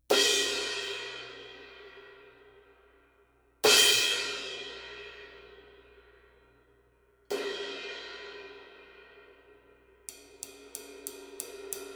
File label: Zildjian 21